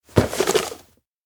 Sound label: box big takeout; awayuki; anemoi